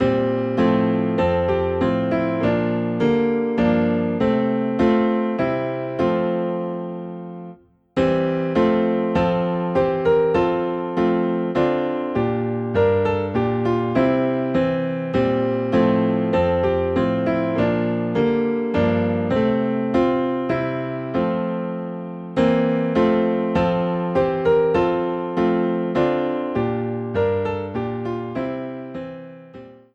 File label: Piano in F; The Master has Come